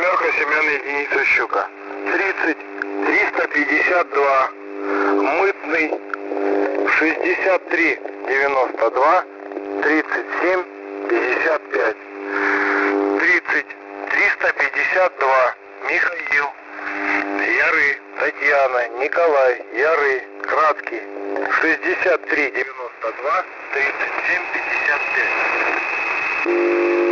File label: usb; 5448.00